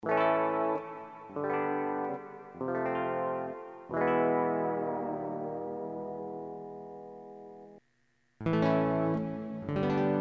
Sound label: Guitar Pick Ups